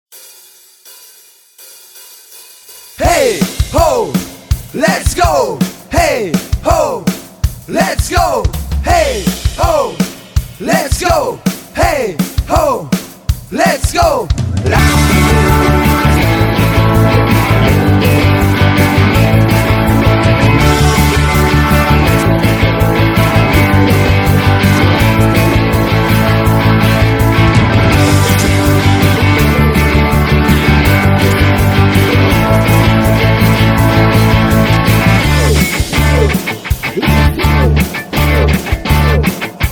karaoke La Castanyera Rockera

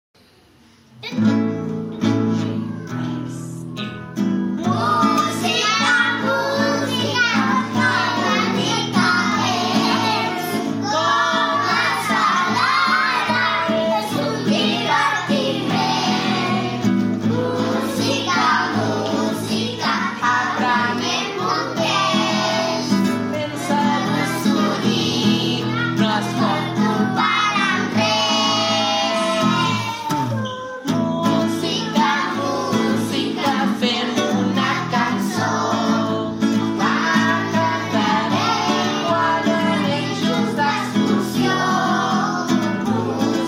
música música

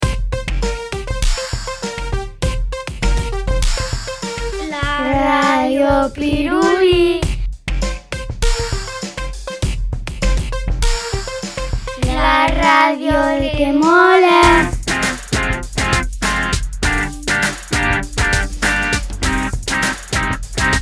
sintonia

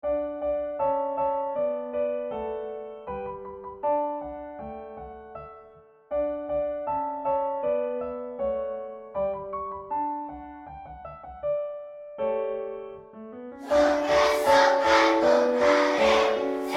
Sempre alerta

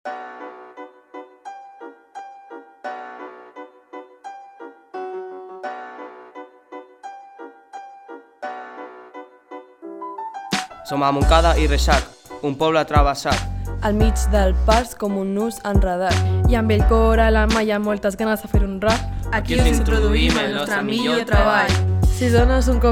Rap; el rap de montcada i reixac

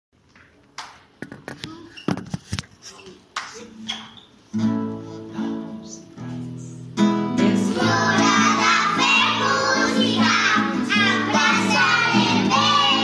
Hora de fer música

